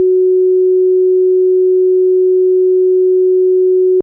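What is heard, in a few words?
Clean Sine DX100 F#6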